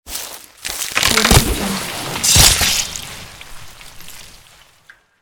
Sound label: tree cracking and falling into spiked dirt pit; industrial sounds